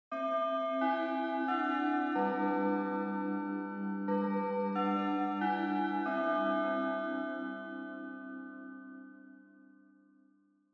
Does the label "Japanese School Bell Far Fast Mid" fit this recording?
Yes